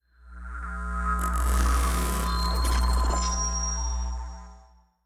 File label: ai crisis room hits; Stellaris; synthetic dawn